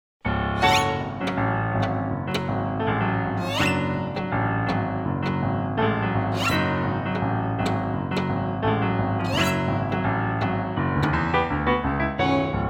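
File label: play reference violin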